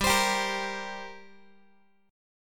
G Minor Double Flat 5th G, Guitar, 4A, 4E, 5A, 5D, 5G sharp, 6C sharp; strummed